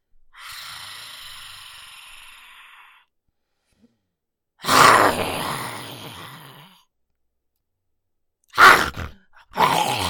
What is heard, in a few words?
reptile monster sounds